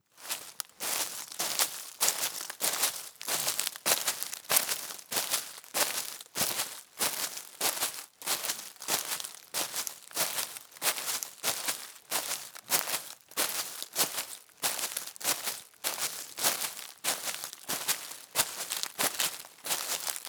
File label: Walking Faster Leaves Foley Footsteps; Sounds Visual; Footsteps on Leaves